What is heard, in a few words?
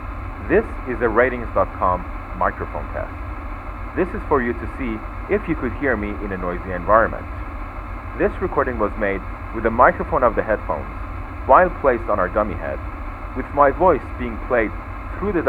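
in line mic noise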